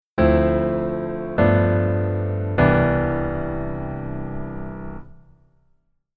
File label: Keyboard Voicing Hi